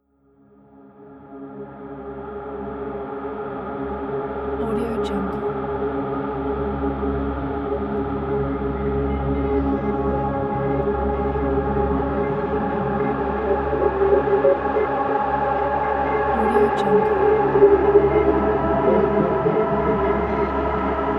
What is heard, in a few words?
enigmatic space soundscape